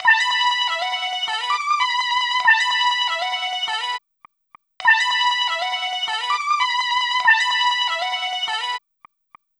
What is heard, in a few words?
pizzi lead; Polyend; Loops n trouble